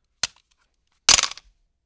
Gun Grab Pistol Toss Down Metal; Foley - Props; Foley Essentials

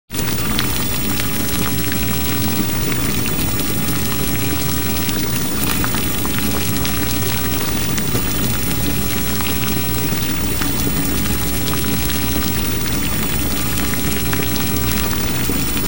small fire place; SOUND EFFECTS1

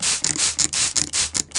SPRAY CAN

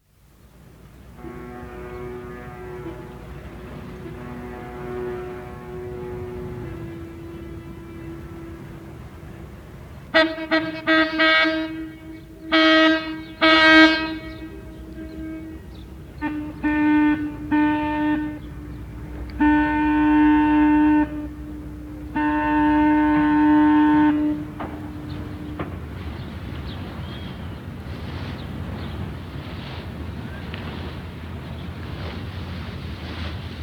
Ships In the Harbor, Nature Sounds; Dr. Sound Effects; 210 Nature Sounds - 20 Hours of Relaxing Natural Ambiences for Meditation and Sleep

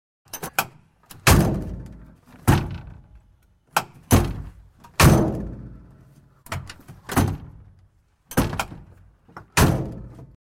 Truck Door Open & Close; Cars

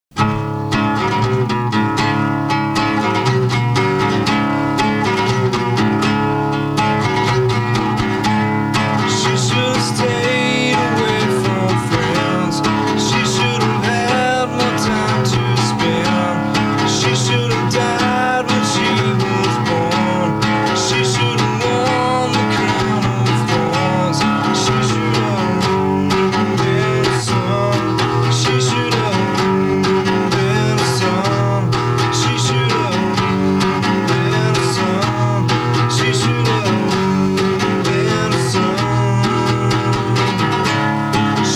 (Solo Acoustic)

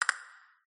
stop reel, Fruit Pot sounds

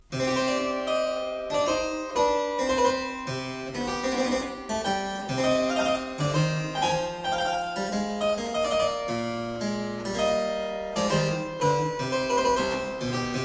Suite in D minor (Minuet); Colin Booth; John Blow

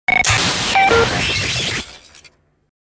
Chatter Skid; DJ sounds

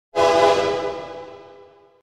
Train Horn with Reverb; Hollywood Edge Sound Designers Tool Kit

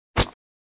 Swish Single; Crashes Impacts

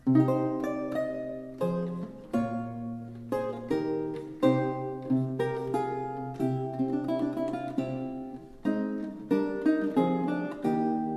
Galliard (lute)

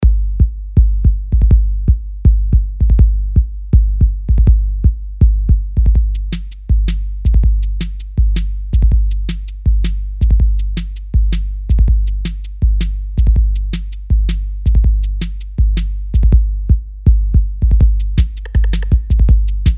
Bed - Dynamic Scribbles; Best Of N2O Library; Beds

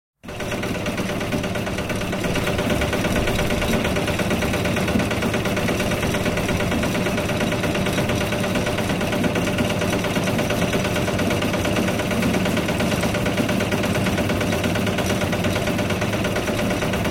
Cement Mixer; 100 sound effects

